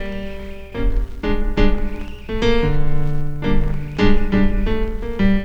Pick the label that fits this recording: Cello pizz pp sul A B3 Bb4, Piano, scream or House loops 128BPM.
Piano